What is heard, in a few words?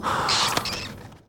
swoosh